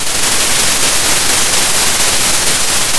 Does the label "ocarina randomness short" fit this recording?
No